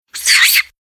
Jungle Animal Monkey Long Tailed Macaque High Shriek Angry; Nature; Monkey Sound Effects